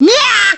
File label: pain